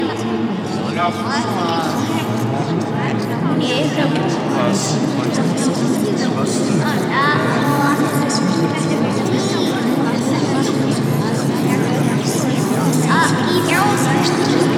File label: Faceling Ambience; Backrooms 2D